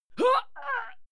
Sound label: female struggle; koerpergeraeusche; menschen; soundpool